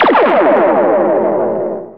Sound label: F X, PERC FX